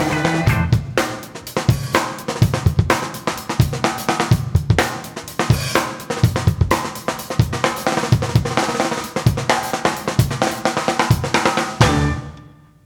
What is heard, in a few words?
breaks